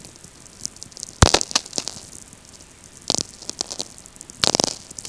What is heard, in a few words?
VLF; StMichel